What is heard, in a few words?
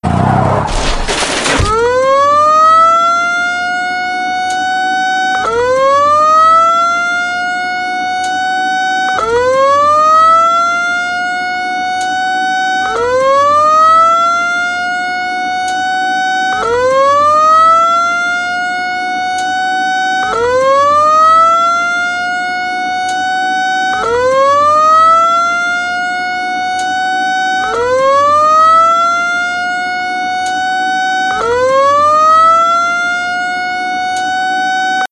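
Chicago Coin Speedway Mark III coin operated driving arcade game; Crash/Ambulance; 1969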